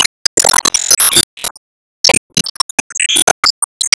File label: Se Enemy Healer Charge